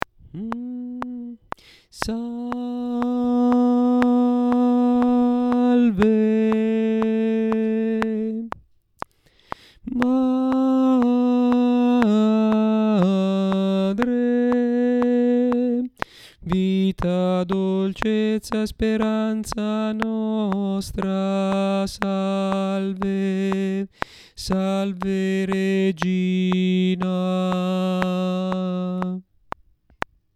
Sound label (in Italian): salve regina, tenori